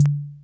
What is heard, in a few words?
KORG SR120; Drums